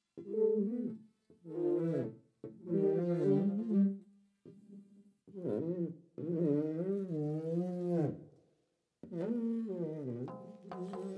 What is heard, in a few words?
Florist Soundscape; Susie Kozawa; Tokio Florist